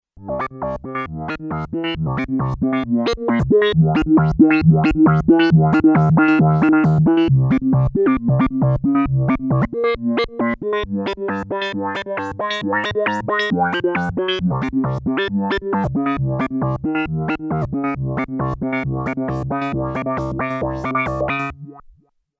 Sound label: microfreak V4 Presets